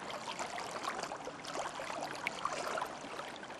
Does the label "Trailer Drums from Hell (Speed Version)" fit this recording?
No